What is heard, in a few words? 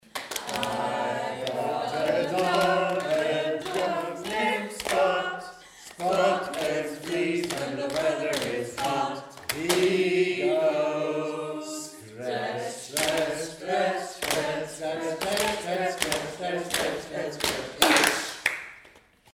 My dog spot